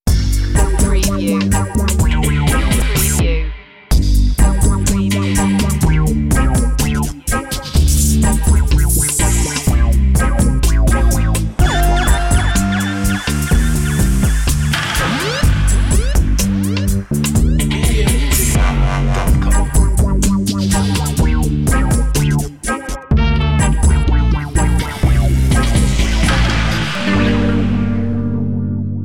dubweed

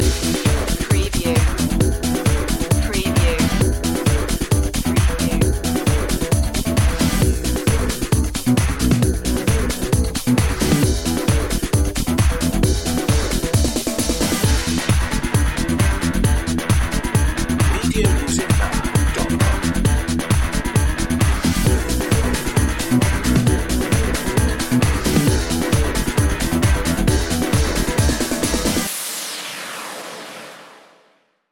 scissor disco

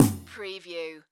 Multimedia Percussion

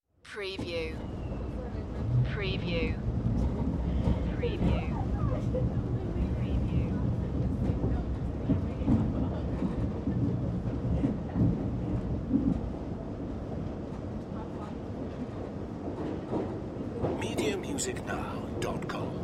Subway Train Interior

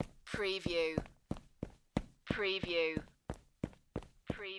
Footstep Tennis Shoe

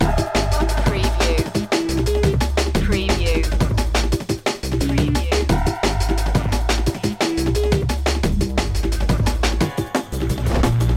spook loop

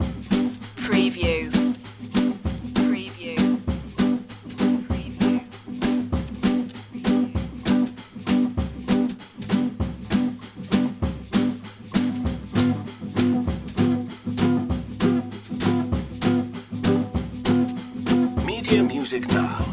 catfishin loop

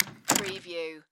Door Close; sound-effects-bible

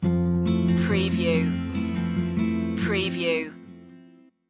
summer rain stinger

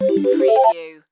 Slot Machine Musical Ditty

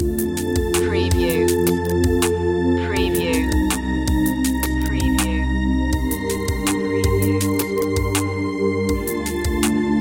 space wind loop

